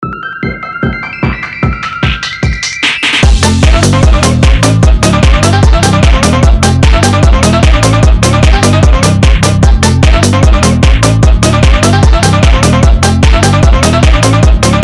phonk remix

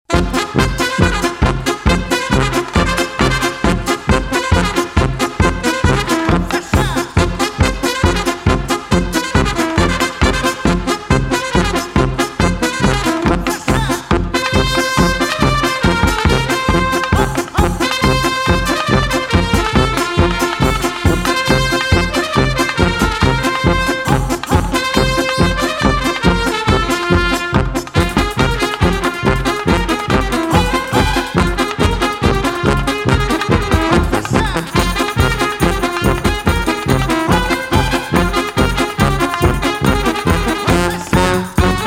fanfare

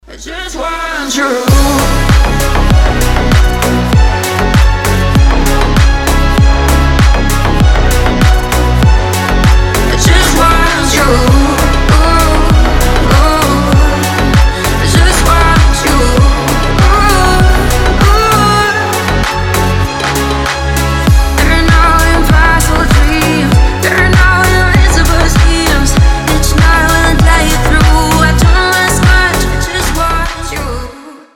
organ

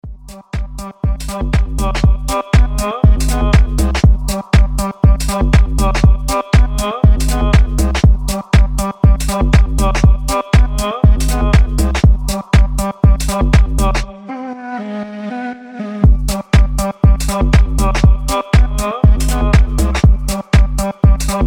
instrumental

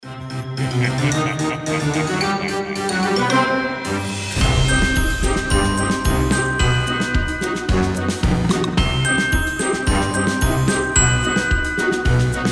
halloween music; sound gallery by dmitry taras

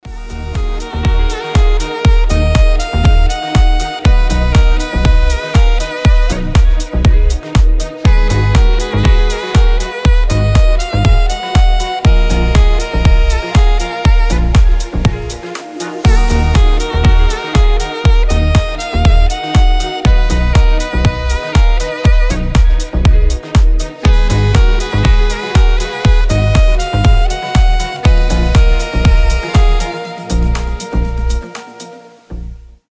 sax melody

